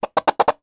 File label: food mayonnaise bottle empty squirt; sound effects one